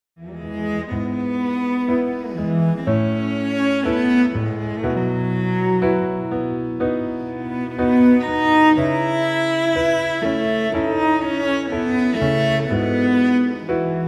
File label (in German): Cello Klavier